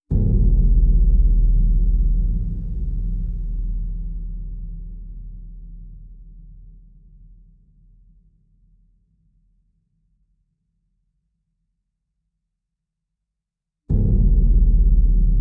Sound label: Death Drum